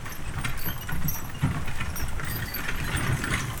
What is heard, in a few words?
Horse Drawn Wagon; Electa Quinney